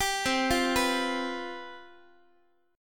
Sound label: C Major 7th Suspended 4th, down, Guitar